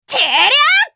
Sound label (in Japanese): 攻撃長; ダリア